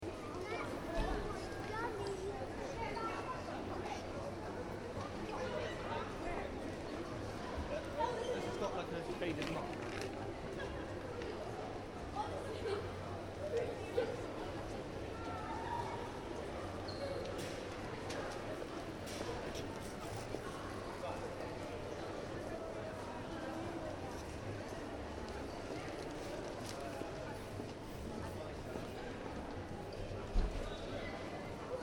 Shopping Mall, Interior: Ambience, Small, Uk; Stores And Mall Sound Effects; Stores and Malls (Sound Effects:Backgrounds); Stuart Duffield

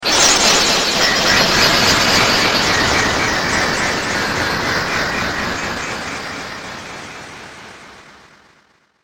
Galactic Glitches, science fiction sound effect; star wars / trek style games

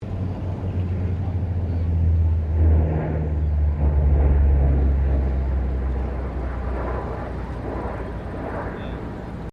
AP-3C Orion pass; RAAF Orion; Airplanes; Vehicles

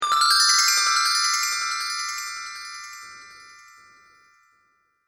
Sparkle Chimes Up Delay, chime sound effects

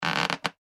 balloon rubbing squeak; Balloon Being Rubbed With Hands - Good Cartoon Stretching / Expanding Sound; Funny Sounds; Comedy; McKinney Sound

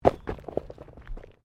Body movement Impact Roll Gravel; Body movement foley to simulate falls and deaths; Rolling; Objects; SoundEffects-Foundation1

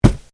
PUNCH

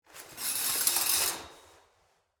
Shower Curtain Close, Locker, Room; Household; Bathroom; BlastWave

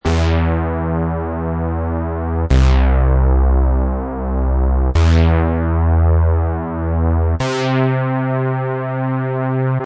Saw Synth Em 98

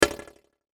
button percussive rattle; Multimedia; Buttons

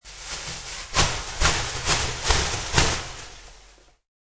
Sounds of opening a trash bag.; Cleaning; Household

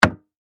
Industry Hammer Impact Wood; Hammers; Tools

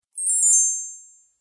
Magic Spell Gliss; Magic Sound Effects

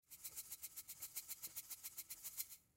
Seasoning Bottle Shake; Food; Foley; Food Sound Effects; Household

